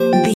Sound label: ui menu button click